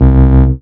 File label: Smooth Synth C1; Multisamples; Synths; Ableton Live 12 Lite; Core Library